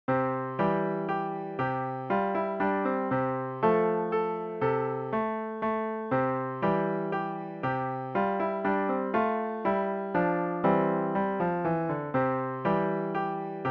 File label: lavenders blue piano